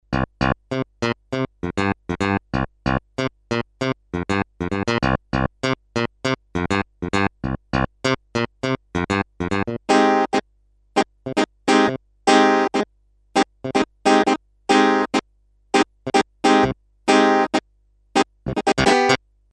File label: CLAVI, Roland MKS-20